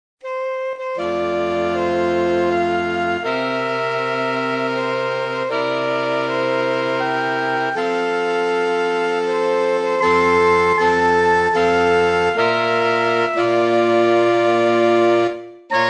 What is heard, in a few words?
happy birthday sax